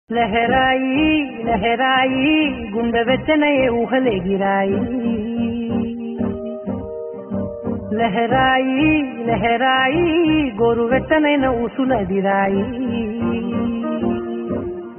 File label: telugu love songs; most eligible bachelor